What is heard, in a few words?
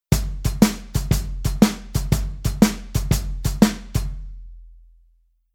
Rhythm Shuffle